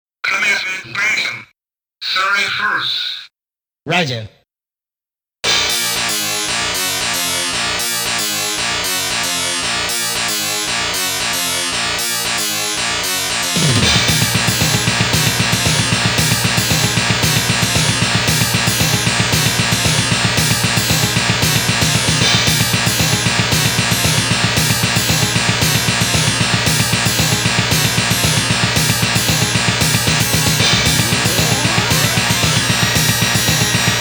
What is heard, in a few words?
Stage BGM; nmk arcade sound digital collection vol. 2